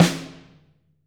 ST AMB SN, STEREO AMB SNARE

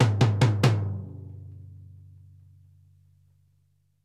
8THS RACK, TOMS; Sampleheads; New York City Drumworks VOL-1; SP TOMS

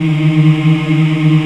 VOX AFMM, VOX Afro Mms, Africa

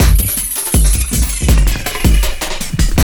JUNGL 160, JUNGLE LOOP

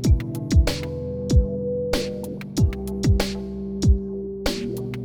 loop; New Breaks

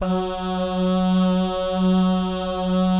BAH GUY, Vocal Planet Jazz & FX; ILIO; BAHS GUYS

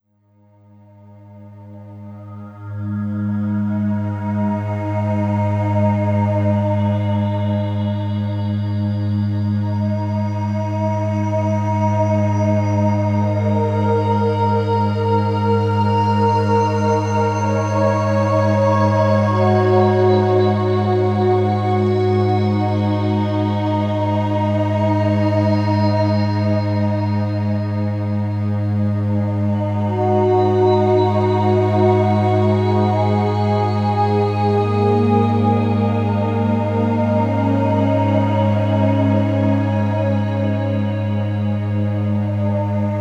Ambient Bed; Beds; Sonic Foundry (Sony Creative Software) - Slow Silhouette

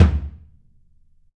DW 16X18, Drums; Big Fish Audio; Ross Garfield Drums VOL-2; ST LOUIS KIT

